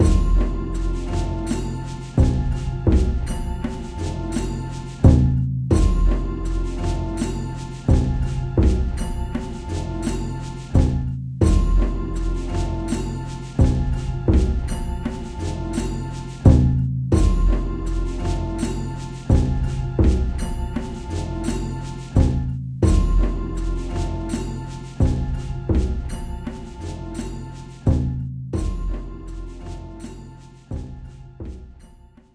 Music Loop; Infinite Hole; Music Loop 19

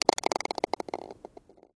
Sfx Golf

